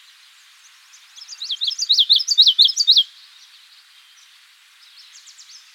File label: common yellowthroat